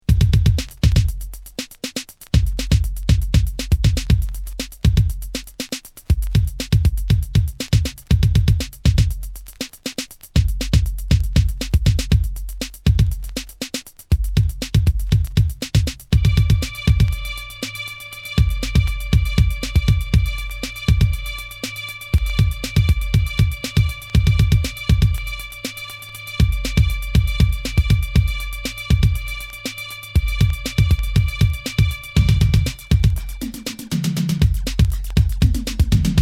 beat

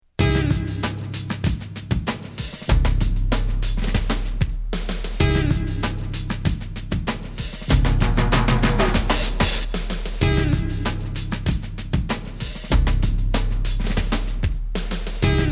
beat

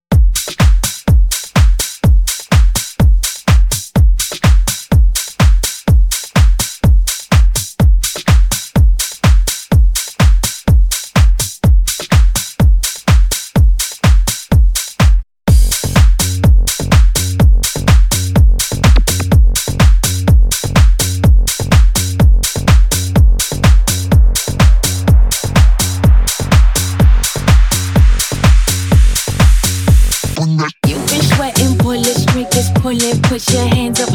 bpm 125

